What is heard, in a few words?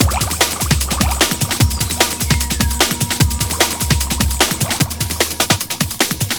Enchanted Break, Jungle Breaks; Blu Mar Ten - Jungle Jungle - 1989 to 1999 Samplepack